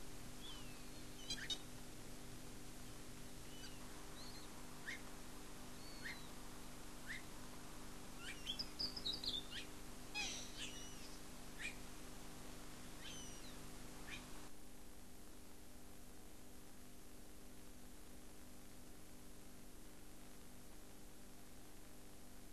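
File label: australian koel juvenile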